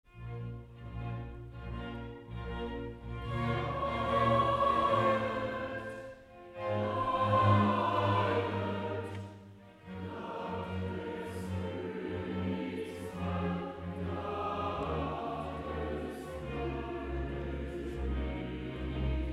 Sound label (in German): Chor, Intermezzo